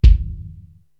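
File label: bass drum Jazz, Korg TR-Rack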